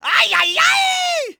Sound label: vce exclaim swing